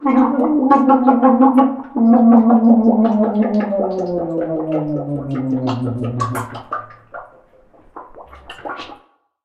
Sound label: drown; human; NS Studios - ultra sound collection